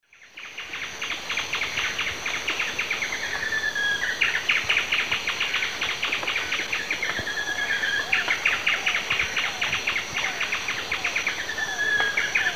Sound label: Xiphorhynchus ocellatus, song; S. America; Eastern Lowlands